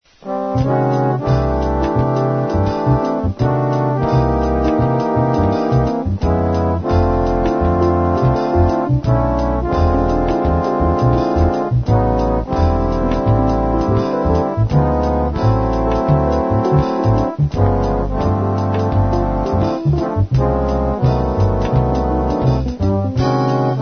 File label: trombones